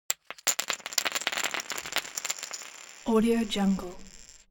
object table game poker chips drop

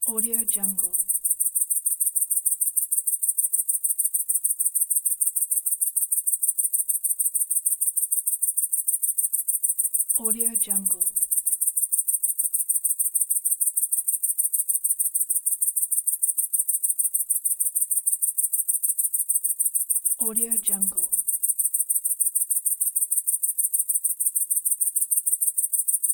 cicadas

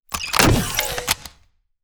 future weapons mines charge; future weapons 2; soundmorph

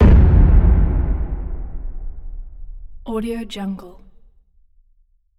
boom stinger descending tail

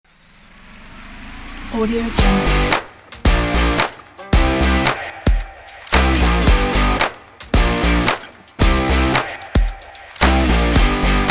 energetic indie stomp and claps rock

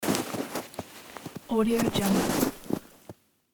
walking in deep snow

